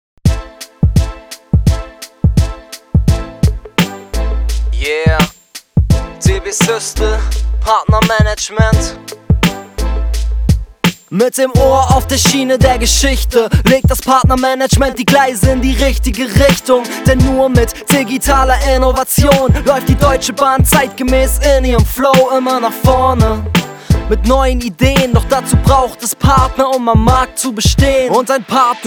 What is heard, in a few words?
Rap